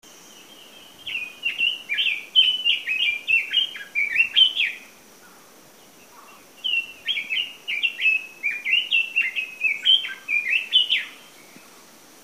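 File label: sosicho, Ashy minivet; bird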